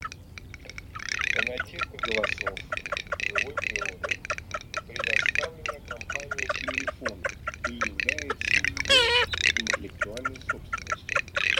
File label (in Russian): Чирок-трескунок Marzaiola; plurifon